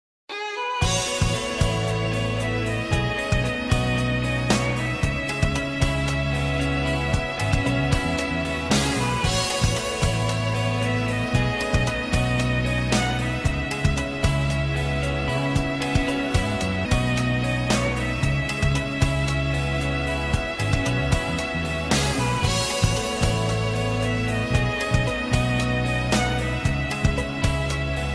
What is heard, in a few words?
soft tone